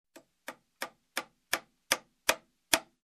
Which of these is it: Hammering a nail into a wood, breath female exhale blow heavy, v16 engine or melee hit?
Hammering a nail into a wood